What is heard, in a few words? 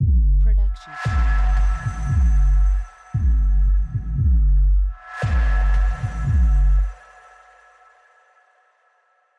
heartbeat logo; soundscrate